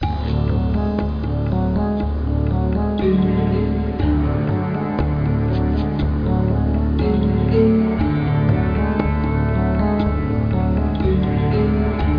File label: Cave; Freddi Fish 3 The Case of the Stolen Conch Shell